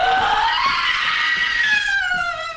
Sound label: ghost woman scream